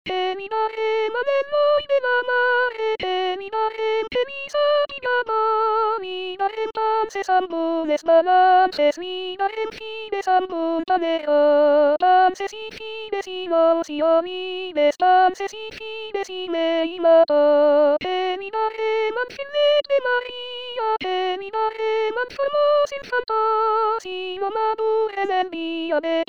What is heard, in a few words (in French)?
soprane; el noi de la mare